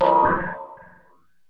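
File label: percussion, ambient, 160; eJay; Drum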